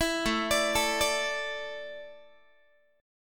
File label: A Suspended 4th, Guitar; Asus4; arpeggiated; 19,17,17,19,18,x